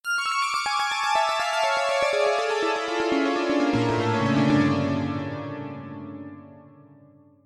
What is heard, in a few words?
synth piano binary piano; zasfx